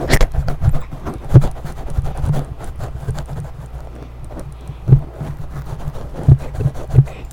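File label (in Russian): собака черт возьми травмирует микрофон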